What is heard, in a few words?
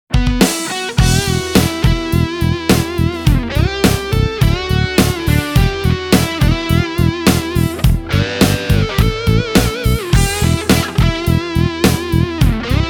BAREKNUCLE CRAWLER 6 NICKEL NICKEL SCREW